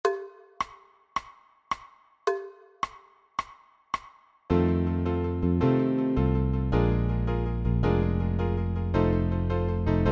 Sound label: drums shuffle